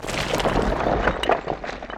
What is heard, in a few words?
debris; fixed length and audio balance